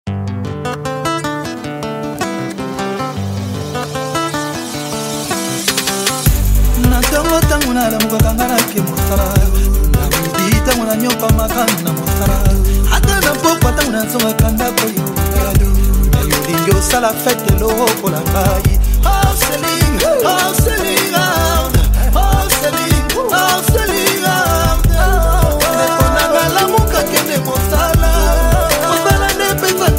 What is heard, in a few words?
Ringtone